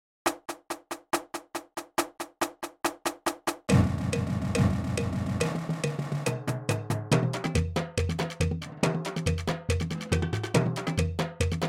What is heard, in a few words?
140, drumline